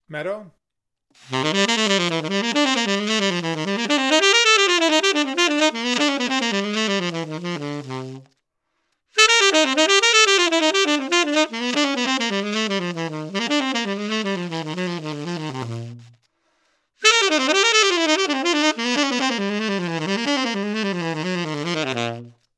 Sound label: Bebop Metal